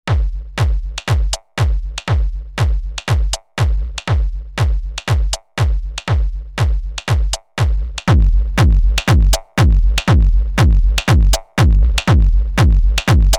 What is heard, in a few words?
Dist Coldfire Drumloop; ARTURIA